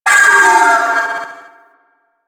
Trooper Vocal Death; Warframe; Sentients

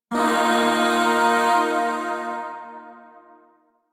Shawzin Blues Chord I; Chords; Warframe; Zariman; Void

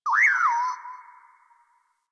Night Zone Tree Critters, Ambience Plains; Warframe; Eidolon Plains; One Offs

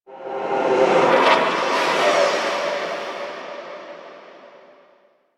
Shrine Ghost Cry A; Warframe; Revenant

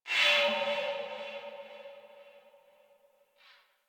Ship Tech Flow Texture Med, Ambience; Warframe; Corpus Ship Remaster

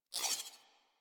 UI Dialog Open; Corpus Theme; Warframe

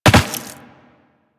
Land Rock, Footsteps; Warframe; Tenno

